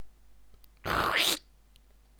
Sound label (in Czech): zvuk evokujici zestihleni